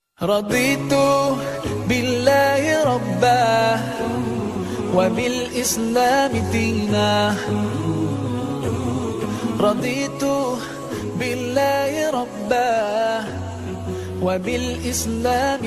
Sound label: arabic ringtone